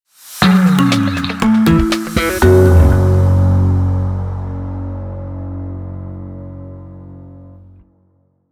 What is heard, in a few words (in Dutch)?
Logomuziek Bedje Bumper